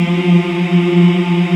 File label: VOX AFMM, VOX Afro Mms, Africa